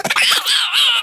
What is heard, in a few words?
Vibrant Squirrel Play